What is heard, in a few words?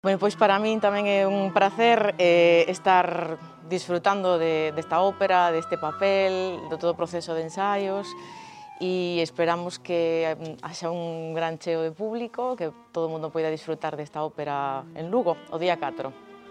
soprano, opera